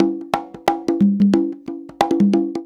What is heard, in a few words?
90 PERC; Best Service Advanced Media Trax Modern Composer